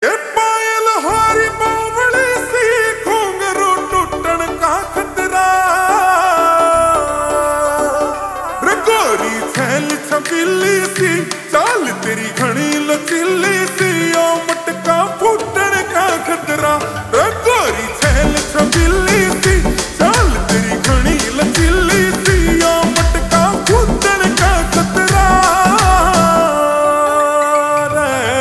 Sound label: Slow Reverb Version Ringtone, haryanvi